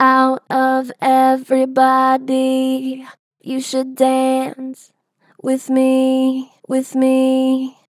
Str Flat Out of everybody, Vocals, Pitch Flat Strong